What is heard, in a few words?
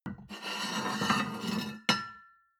plate on plate take slide soft, restaurant cafe